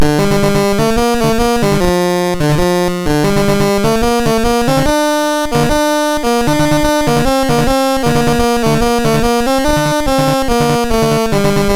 MOS6581R3, GAMES; Tank

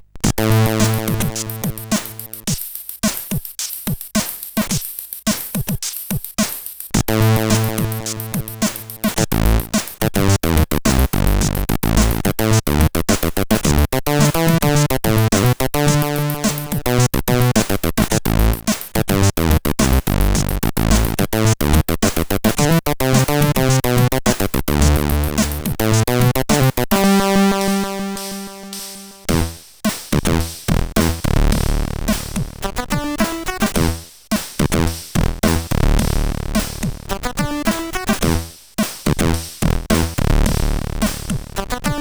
Funkt, sid, CSG8580R5

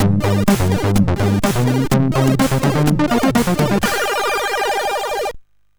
sid MOS6581R4; Tomcat

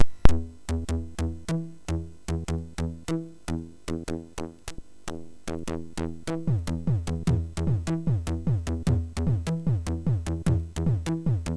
Basser, sid MOS6581R3; hvsc

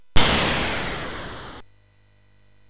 Final Synth Sample, MOS6581R3; hvsc

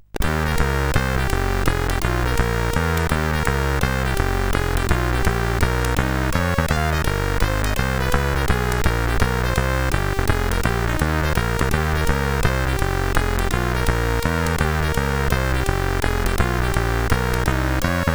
Jazz, sid CSG 8580R5

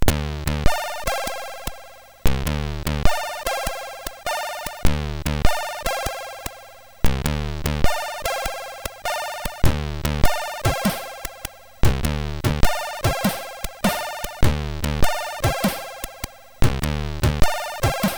Game Over MOS6581R3

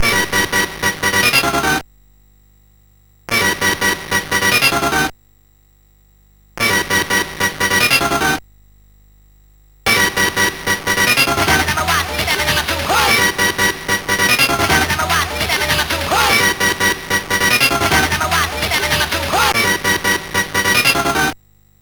intro, sid CSG8580; Miracle 8; hvsc

